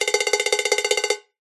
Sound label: cartoon run fast; zapsplat